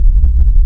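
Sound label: sub loop, engine; Quantum Redshift; XBOX; tara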